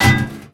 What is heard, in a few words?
metal hit; Bad Piggies